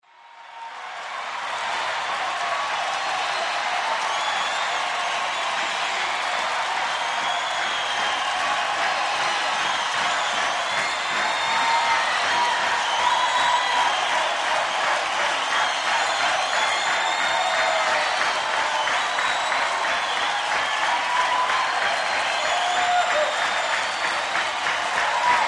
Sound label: Rock concert, large crowd with clapping and shouting